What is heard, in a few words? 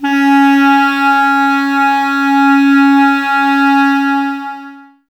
WND CLR, Clarinets; Miroslav Vitous - Woodwind Brass Ensemble; 90's Sample CDs